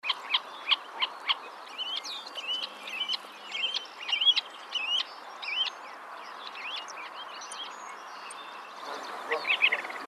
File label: plover semipalmated; species/birds; wbba